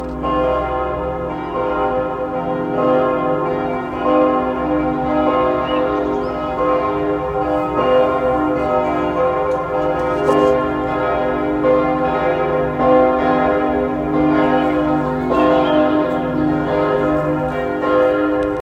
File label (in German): Kirchenglocken